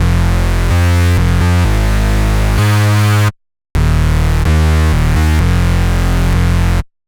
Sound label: 128BPM Bass Root F; Vengeance Samples; Guess What Kit